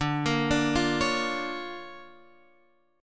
D Minor Major 7th Sharp 5th, D, down, Guitar Open F; DmM7#5 arpeggiated